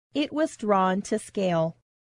scale

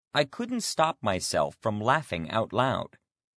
laugh; I couldn't stop myself from laughing out loud.

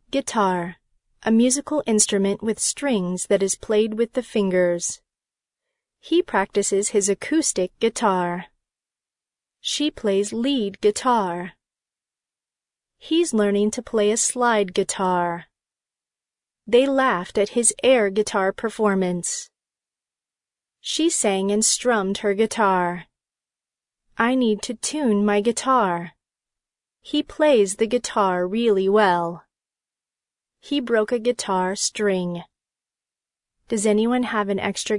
guitar

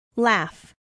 laugh; the act or sound of laughing

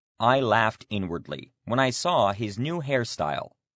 laugh; I laughed inwardly when I saw his new hairstyle.

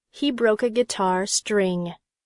guitar; He broke a guitar string.

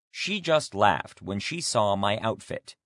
laugh; She just laughed when she saw my outfit.

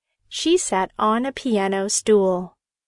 piano; She sat on a piano stool.

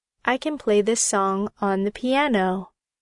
piano; I can play this song on the piano.

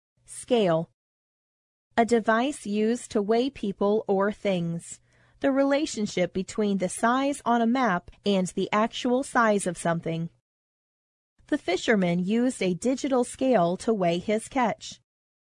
scale

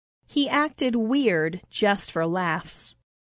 laugh; He acted weird just for laughs.

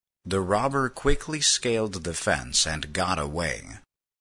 scale

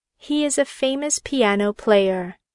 piano; He is a famous piano player.

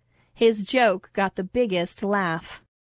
laugh; His joke got the biggest laugh.

